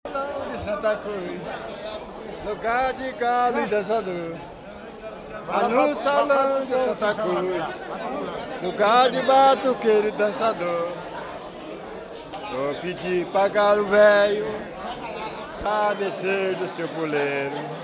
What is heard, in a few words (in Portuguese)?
batuque de umbigada, tiete; la no salao